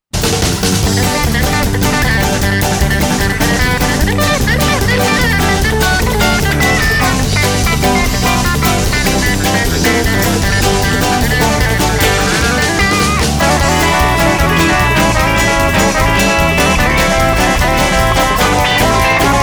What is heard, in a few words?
pick this